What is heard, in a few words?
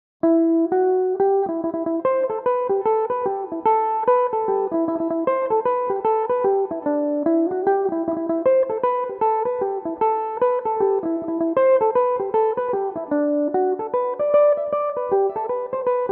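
G major, Irish mandolin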